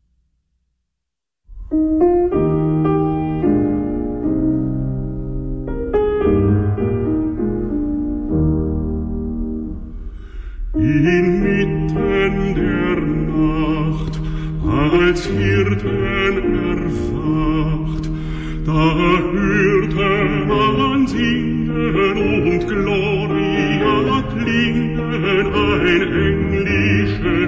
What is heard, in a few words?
piano; Gerold Huber; Inmitten der nacht